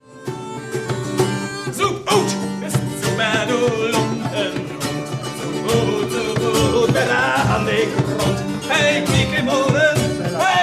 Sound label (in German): sauflied